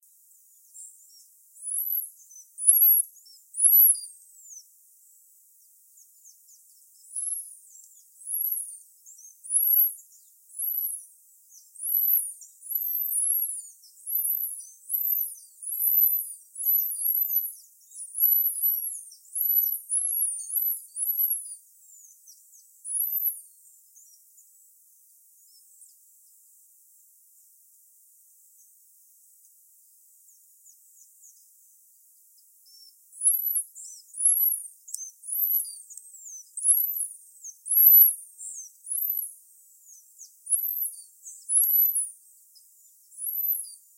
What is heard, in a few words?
Puerto Rican Tanager display; PuertoRico